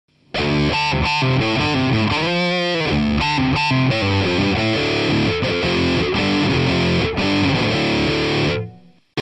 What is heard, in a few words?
Audio-Technica AE6100 Gain (Bridge), guitar